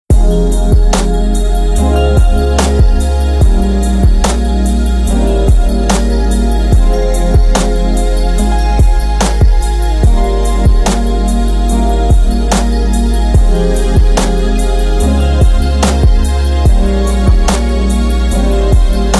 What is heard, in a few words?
Lo Fi Hip Hop; Audiosample